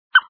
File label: squeak; hopes babysitting maze